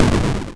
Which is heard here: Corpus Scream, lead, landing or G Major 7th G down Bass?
landing